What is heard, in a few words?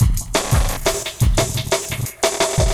Glitched Beat 175BPM; glitch kit